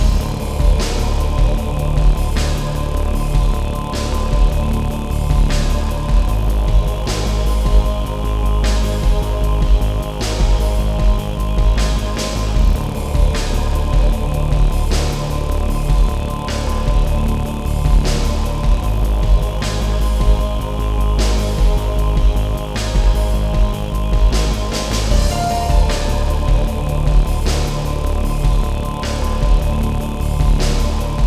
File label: dungeon groove loop high